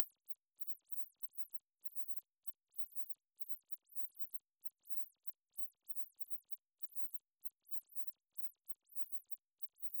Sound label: warbles